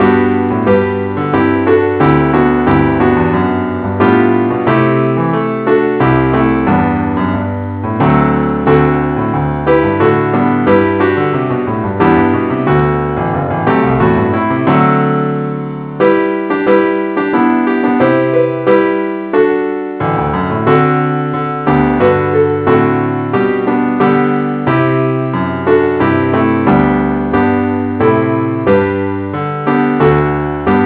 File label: keyboard only key g